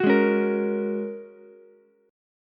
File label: Ab 9th Suspended 4th Ab down Ukulele C6; Ab9sus4 strummed; JGuitar